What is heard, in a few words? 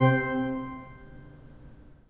PIPE, Organs; E-MU Producer Series Vol. 5 – 3-D Audio Collection; 3D Pipes Dr Joyce